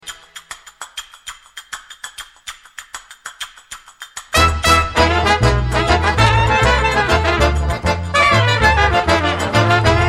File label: Cocek, klezmer